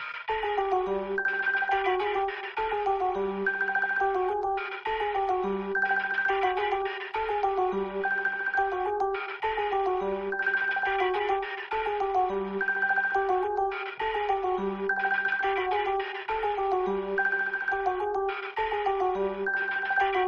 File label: funk ringtone